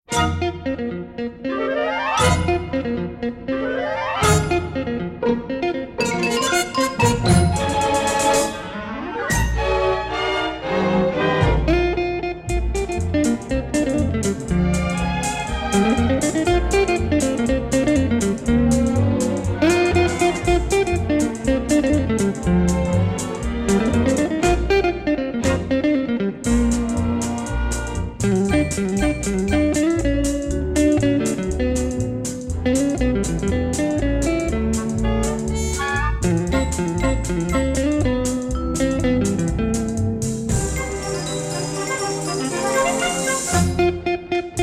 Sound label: sleigh ride jingle bells